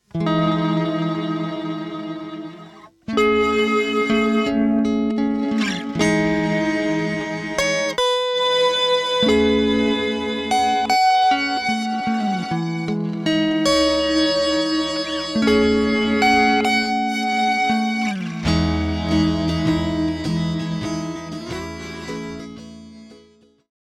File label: Blur Guit